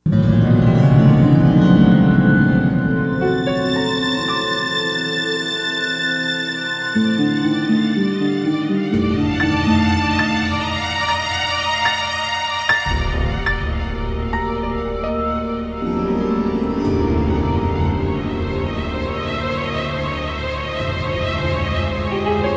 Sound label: Suspense